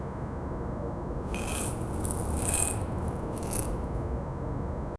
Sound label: etching a name into stone